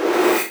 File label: parting shot preattack; weapons; dota; muerta